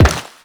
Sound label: Step Metal, Footsteps; Warframe; The New War; Characters; Kahl